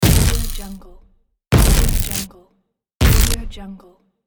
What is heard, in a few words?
small electrical explosions